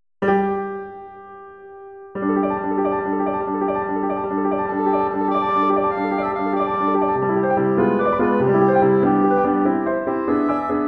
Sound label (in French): Mandoline